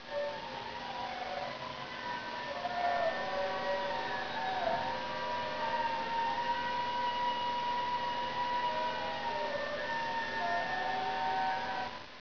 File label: SQUEAK; homm2